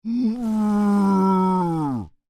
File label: Creature, Human, Moan, Med; Groaning; Sound Effects:Voice; Big Room Sound